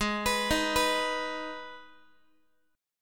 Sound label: G sharp Minor G sharp down Guitar, chord; G#m arpeggiated; JGuitar